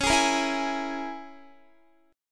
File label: Db Minor 6th Db down Guitar; strummed